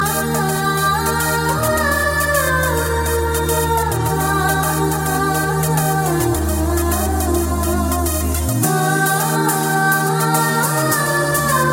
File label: Humming